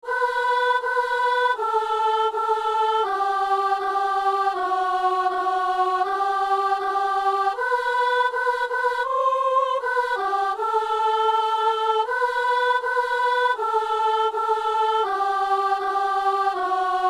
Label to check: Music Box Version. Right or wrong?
Wrong